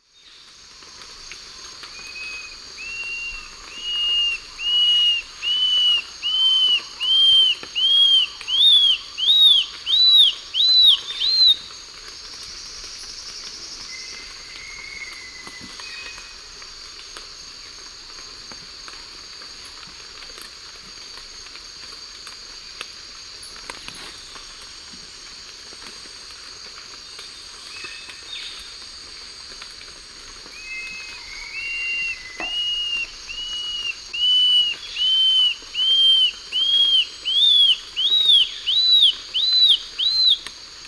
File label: Myrmotherula menetriesii, song, S. America Eastern Lowlands